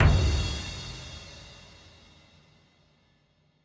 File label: Loose Champ